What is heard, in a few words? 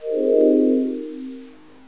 teleport out